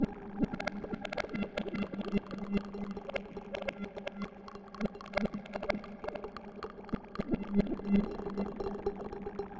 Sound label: Warp Wave Drum; Rhythmic Atmospheres